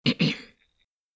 tieng u hu ra am hieu hay to ve kho chiu

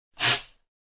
tiếng chổi quét 1 tiếng duy nhất

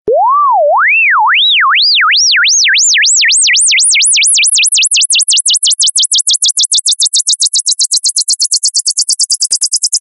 nhạc chuông tin nhắn to nhất

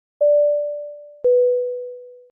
tiếng chuông ding dong máy bay

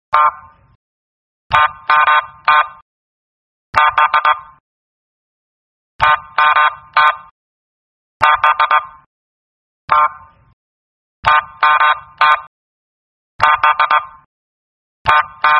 tieng coi xe canh sat giao thong

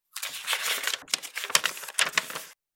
Âm thanh tiếng mở trang sách vở giấy tờ

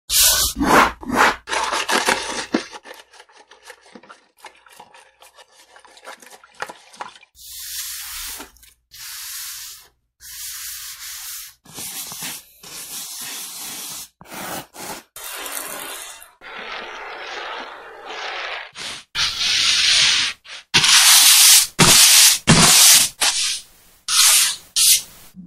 tieng co rua cha rua lau chui don dep

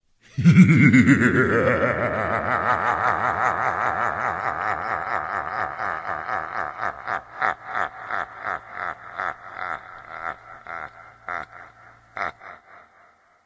tiếng cười halloween

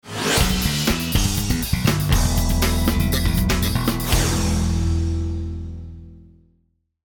Giai dieu mo dau video hay

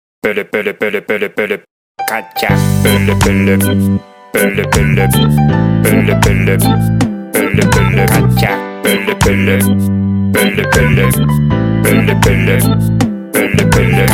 nhac chuong reggae ver; denden mushi one piece

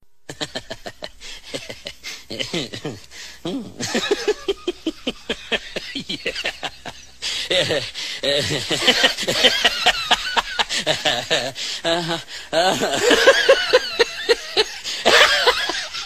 Tieng cuoi su tu

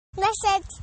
am thanh thong bao tieng tre con; messenger

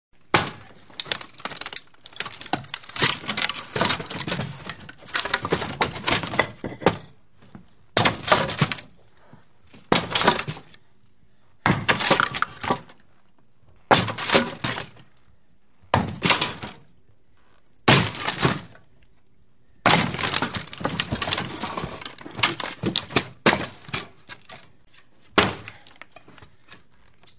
Tieng dung riu bua cui chat che cay go

